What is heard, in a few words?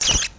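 Bat Damage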